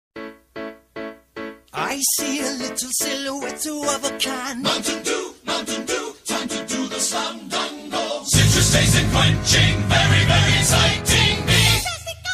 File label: commercial song; mountain dew